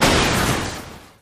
Metal Impact Loco Hi

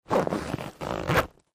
Zipper Close; zippers

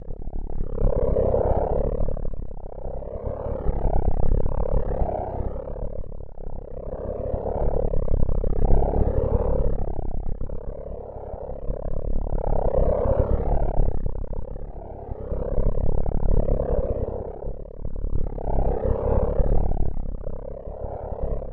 Force Field

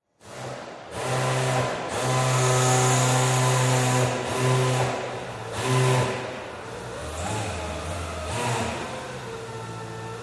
Aircraft Hangar

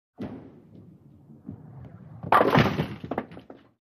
Throw Bowling Ball Ro

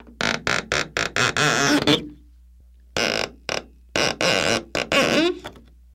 Nail Rips Stretch X2; hammers

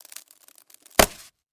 Styrofoam Sheet Crac, plastic

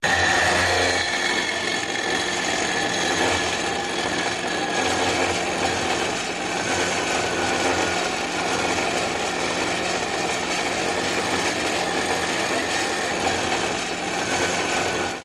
Grinder Metal

